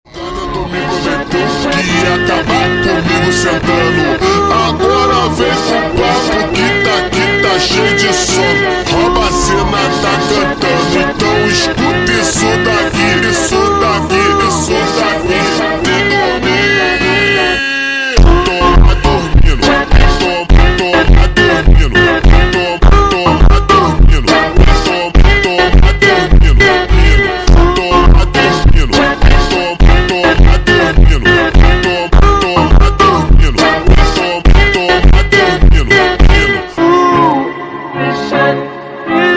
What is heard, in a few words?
analog horror funk, super slowed reverb; tiktok